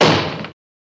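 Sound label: Door Bang Against Wall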